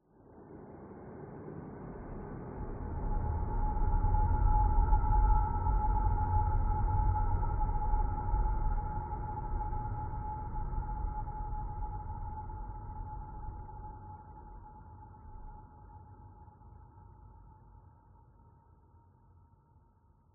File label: TENSION BUILDERS; PMSFX